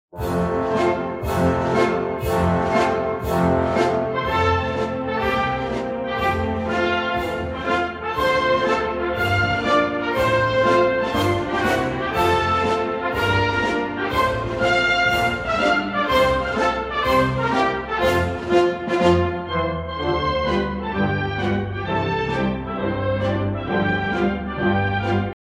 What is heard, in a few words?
Satanella Male Variation FAST